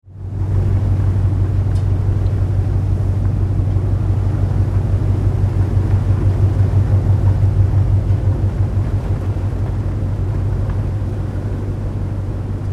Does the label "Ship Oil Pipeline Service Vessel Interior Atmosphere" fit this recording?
Yes